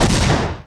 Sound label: Clockwork Beetle Click Explode; Playable Tutorial Level